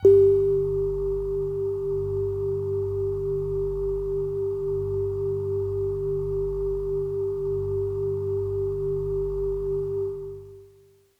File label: Bell Air Kawaii G-1